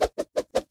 lasso loop; toystory; jessie

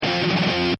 icon Roll; kickbuttowski; mellowbrook mayhem

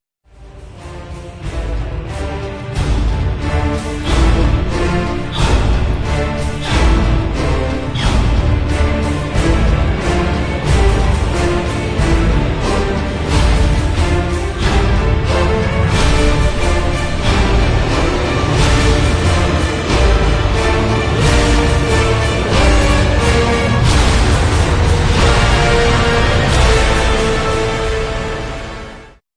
Underwater March; Pirates; Curse of the Black Pearl

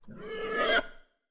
Squeal; Monsters University; Archie

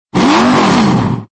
Rev Short; Lightning; Cars

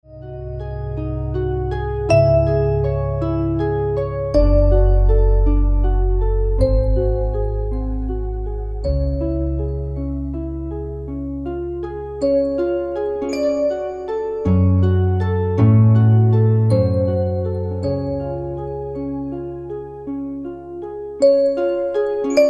piano sonata no 14; baby einstein; disney

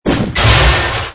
Block Fall; disney; big crane game

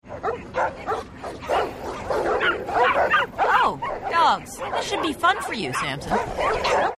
dogs barking; samson; the wild

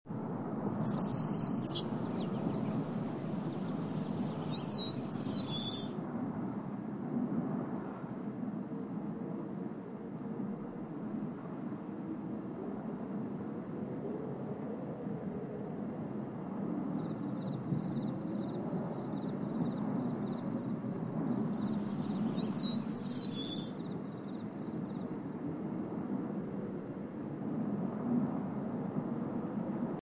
cars background; disney

